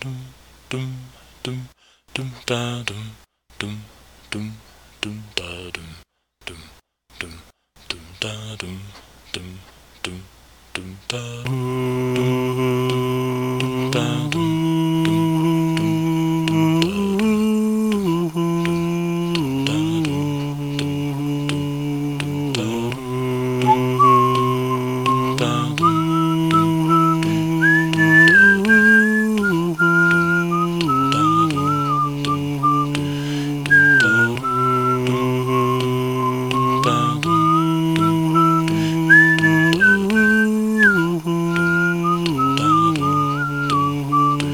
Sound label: riffing